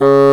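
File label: bassoon C#3; East West - Global Sounds; WOODS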